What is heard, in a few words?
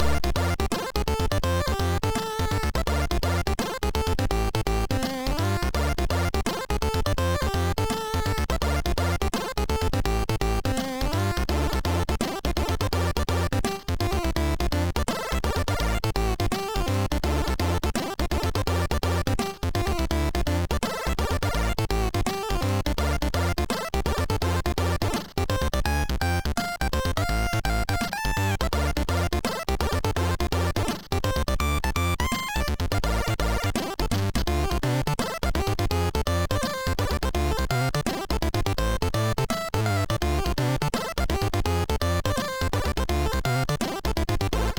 Su-Sweet, MOS6581R2